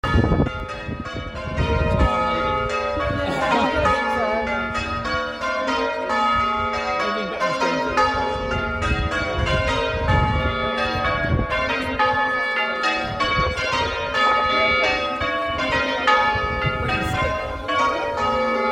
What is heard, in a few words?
Bell ringing; 2022 Platinum Jubilee